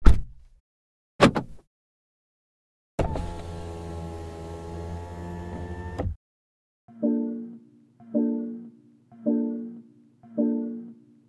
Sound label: foley; krotos igniter